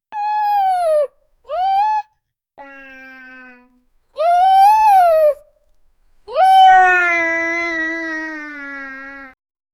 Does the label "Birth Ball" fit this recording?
No